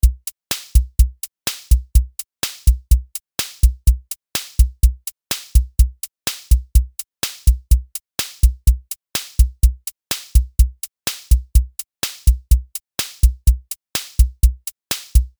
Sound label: rock